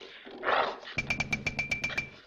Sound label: bark chomp